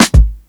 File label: 120 FILL, DRUMS LOOPS; BASEMENT DRUMS VOL 2